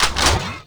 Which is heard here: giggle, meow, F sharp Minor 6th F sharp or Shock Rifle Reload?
Shock Rifle Reload